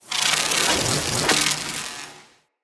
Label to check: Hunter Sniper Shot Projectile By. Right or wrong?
Right